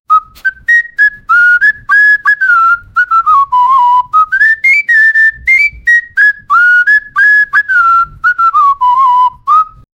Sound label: a whistled, whistle